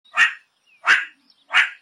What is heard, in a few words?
Meerkat Sound Ringtone